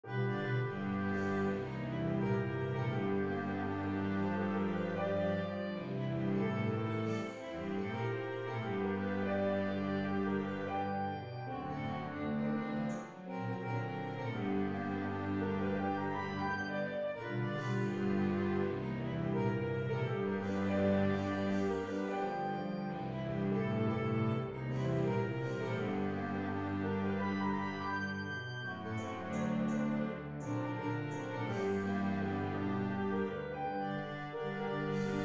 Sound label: string bass